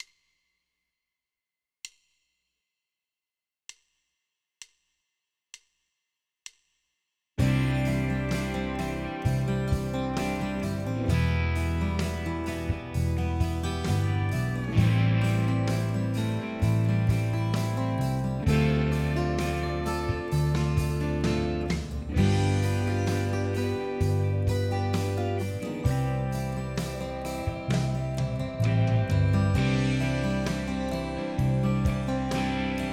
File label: I iii vi ii V7 I